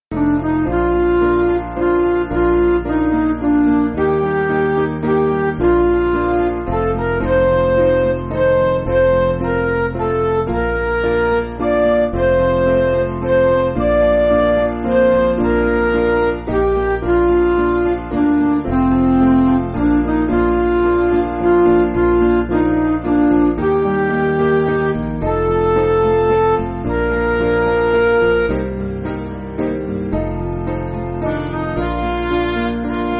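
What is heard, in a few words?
Midi, Music Backing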